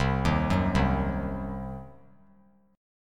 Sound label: C Diminished C, 3C,3F,3A,3D; arpeggiated; JGuitar